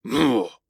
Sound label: Ruins Great Shield Sentry Attack